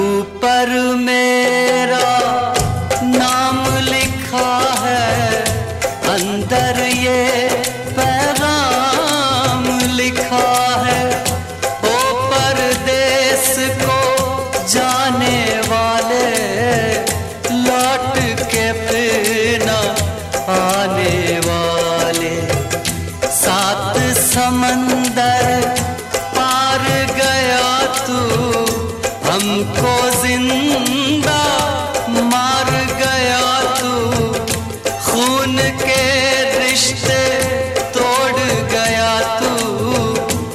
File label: Sad Ringtone